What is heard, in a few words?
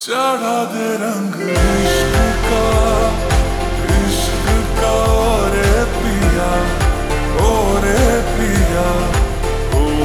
Ringtone Slowed Reverb